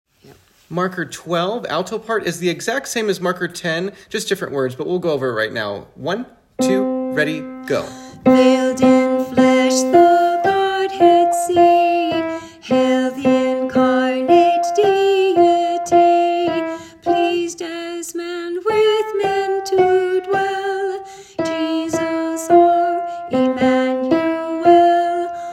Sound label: Alto, choir